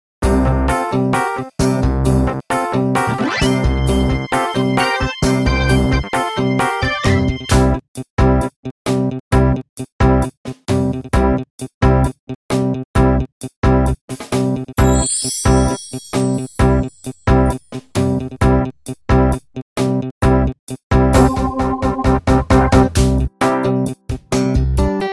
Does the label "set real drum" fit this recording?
Yes